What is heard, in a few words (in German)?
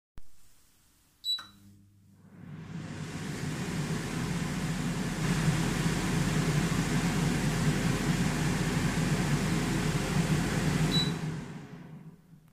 geraeusch